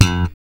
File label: 6 STR FUNK; Spectrasonics; Bass Legends